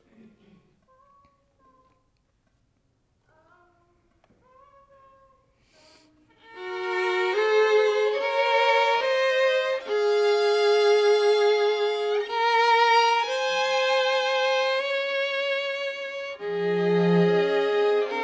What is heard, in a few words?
violin